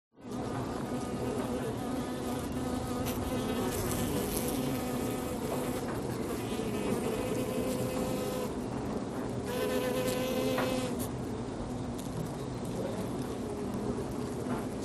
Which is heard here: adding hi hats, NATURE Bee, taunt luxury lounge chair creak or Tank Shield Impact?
NATURE Bee